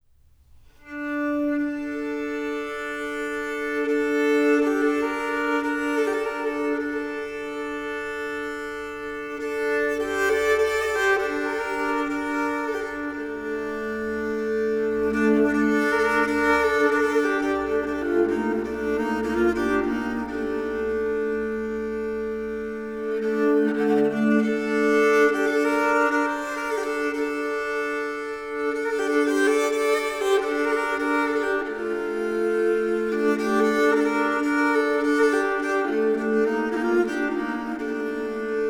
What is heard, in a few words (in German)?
musikinstrumentenmuseum vielle